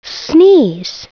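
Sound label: sneeze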